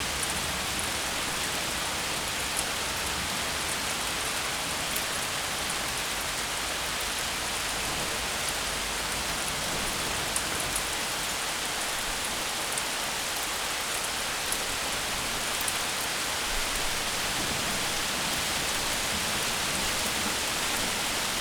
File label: RAIN HARD; SIMUZENSOUND; Weather